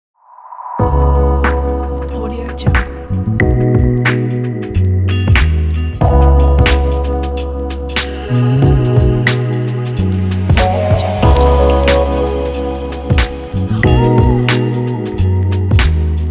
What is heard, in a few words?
chill hip hop lounge logo